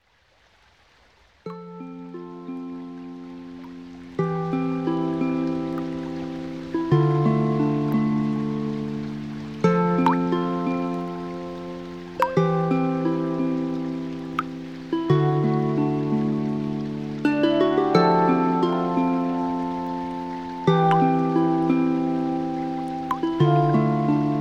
Creek, alarms; Honor Pad X7

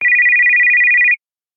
Digital Alarm Clock; Infinix XOS 16; alarms

